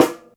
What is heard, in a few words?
dope snare single hit e key; snare drum samples